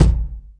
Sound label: subtle reverb kick drum one shot g sharp key